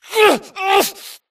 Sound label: grunt Struggle; Titanfall 2